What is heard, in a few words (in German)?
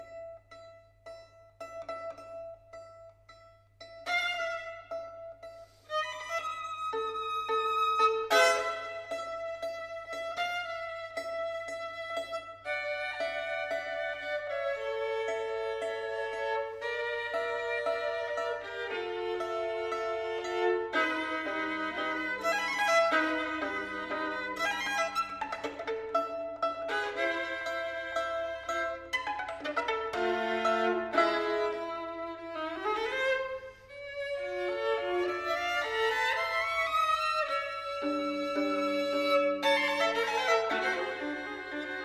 Suite Violine Solo; 2.Satz; Aus 'Nouveautés' 2.Satz - Holger Grohs/Violine; Eberhard Klemmstein